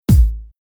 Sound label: Rhythm